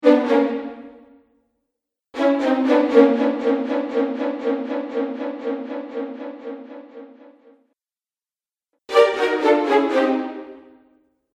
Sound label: Fail Strings Collection, cartoon music